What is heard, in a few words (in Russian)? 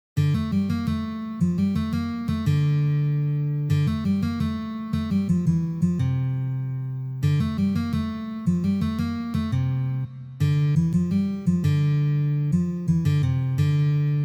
na gitare prostoj variant